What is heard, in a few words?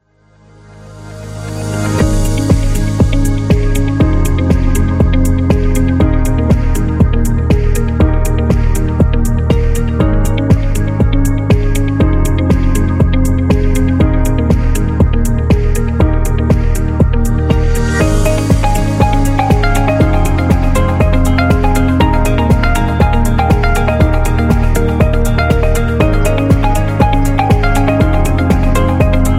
Digital